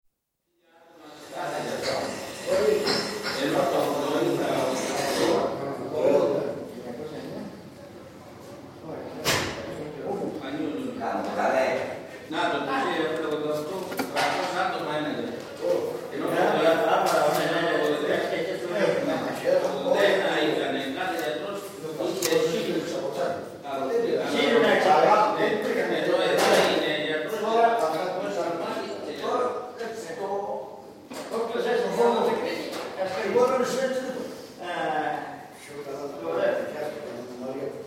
Greece: Cafe atmosphere, men chatter quite aminatedly, with chinks of cups & coffe machine; Restaurant; BBC